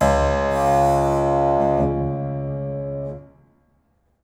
String Pluck Buzz, piano; Piano Miscellaneous

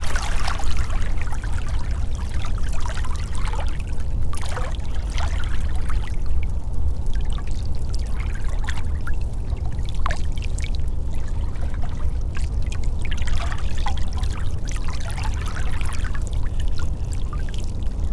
waves Stronger Oxford Island Lough Neagh; Sound Scapes

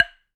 Wooden Bar Strike

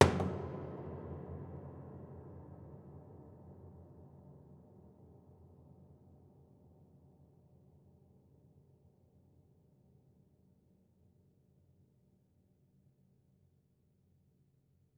Body Thump Ped, Piano

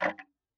Tick, Clocks Kitchen Clock

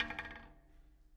Spoke Bounce, Bicycle

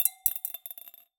Drop In Glass, Medium Wine Glass

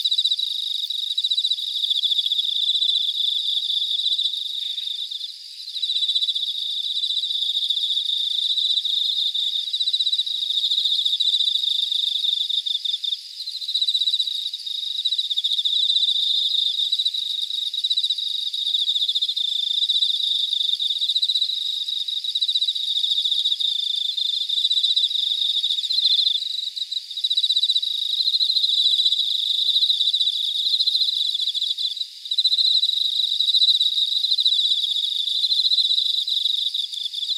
Cicadas Weinan Filtered; Sound Scapes

